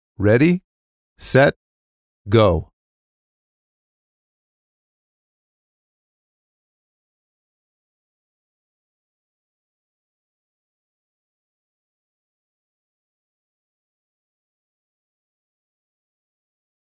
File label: Funkey; Updog